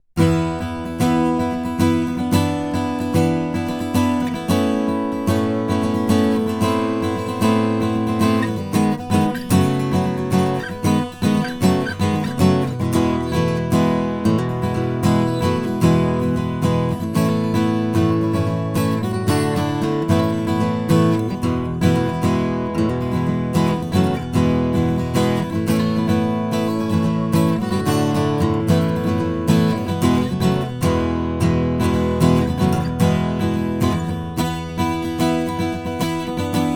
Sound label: strum raw, guitar